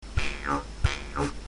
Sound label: breath